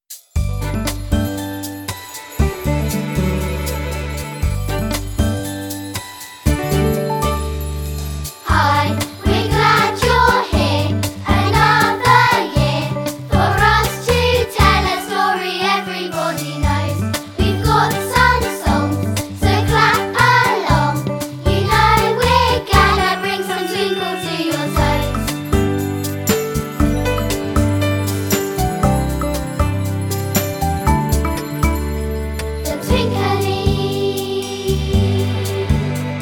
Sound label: Vocal